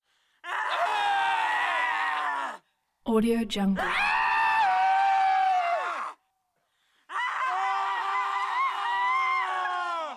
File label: screams group of male female